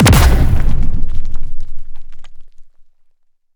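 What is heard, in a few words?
military xbow, impact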